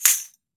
JINGLE CLAP, JINGLE BELL; AKAI S-Series CD-ROM Sound Library VOL-5

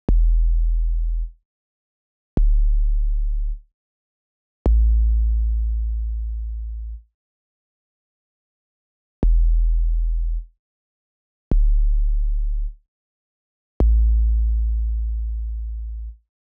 Bass, 105BPM Emin; 99Sounds; Monosounds; Music Loops; PANDORA BOX!; Motel Love

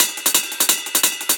PRCLP, Percussion Loops; neuro; Stanza; Drums

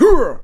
HUWAH; RAP CITY 1

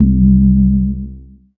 Single Electric Basses, Dance Floor Bass

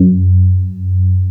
DEF F#2, BASSES

